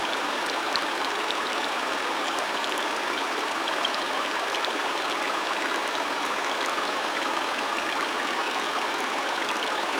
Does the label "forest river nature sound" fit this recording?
Yes